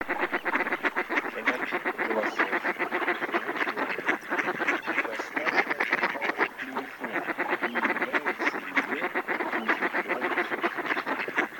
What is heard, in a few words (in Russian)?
Кряква Germano; plurifon